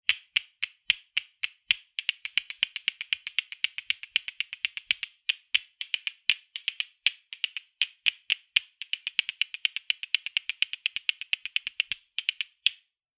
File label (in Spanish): pitos pulgaretas